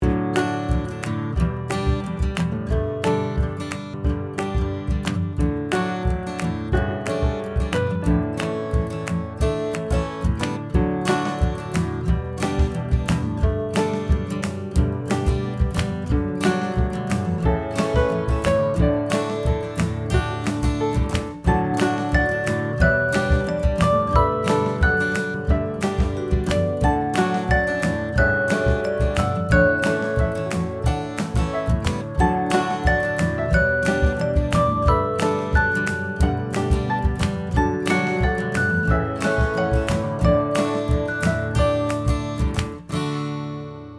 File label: Marketing Music